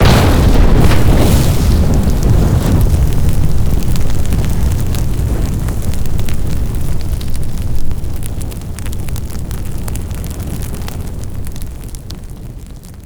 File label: Big Explosion; Stefano Cremona; Explosions